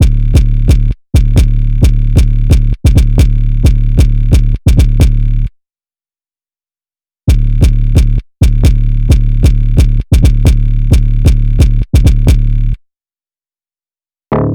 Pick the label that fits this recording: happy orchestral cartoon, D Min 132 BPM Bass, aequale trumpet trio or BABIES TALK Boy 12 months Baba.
D Min 132 BPM Bass